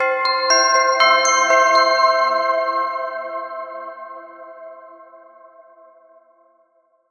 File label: doorbell